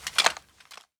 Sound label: suit, footsteps